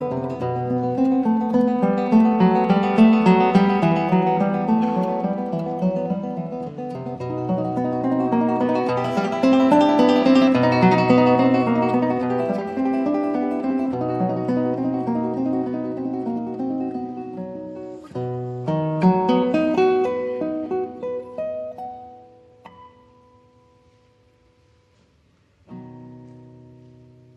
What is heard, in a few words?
croatian relaxing